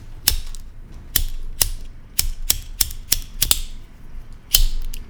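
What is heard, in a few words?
lighter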